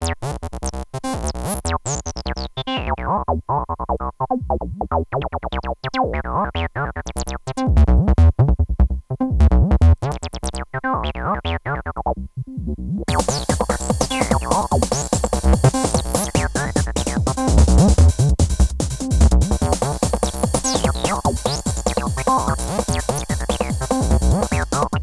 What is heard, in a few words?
square